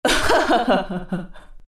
laugh, zh; dota2

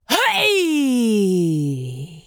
Falling; Tyra; Paladins

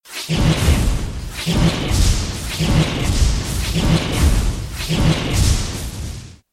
attack; Disruptor

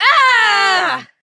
Falling; Seris

